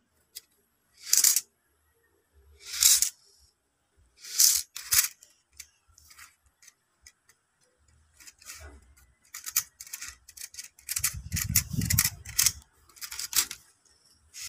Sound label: abacus; چرتکه